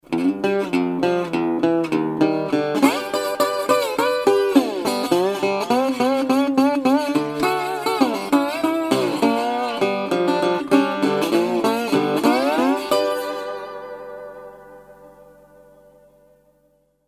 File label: Mississippi, Delta Resonator Guitars